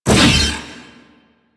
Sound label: Big Slash Whoosh E; Crp Big Slash